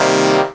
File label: Dance Mega Synth; Best Service; 90 s Sample CDs